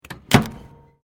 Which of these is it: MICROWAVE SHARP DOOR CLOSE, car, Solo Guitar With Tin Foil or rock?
MICROWAVE SHARP DOOR CLOSE